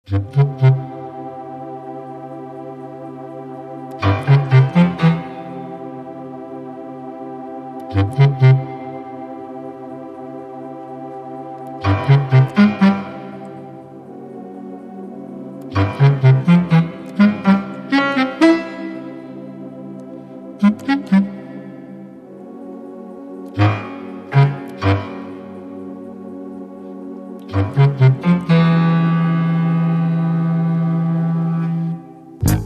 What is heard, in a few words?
suspense; san francisco